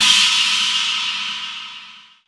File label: roomy crash sound f key; Cymbal; drum sample; one shot